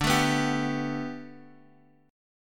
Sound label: D 6th Suspended 4th D, chord; D6sus4 strummed; JGuitar